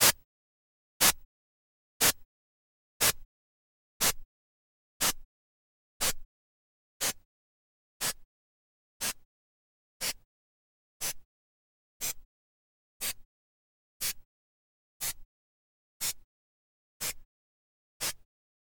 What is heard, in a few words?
NOS, noiseburst